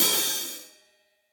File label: Hihat Open Studio Basic; Drum Hits; Ableton; Factory Packs; Session Drums Studio; MPE Hits